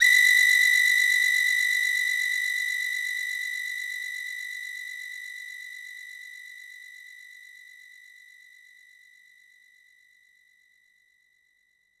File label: Korg 01W Percussion